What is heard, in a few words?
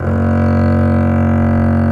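Bass legato F#0; Digital Sound Factory Virtuoso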